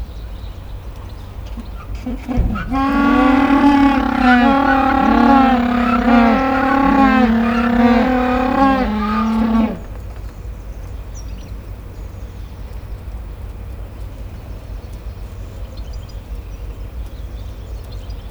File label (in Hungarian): humboldt pingvin; állathangok; nyíregyházi zoo 2010